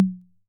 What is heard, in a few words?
Conga High, Korg KR-55B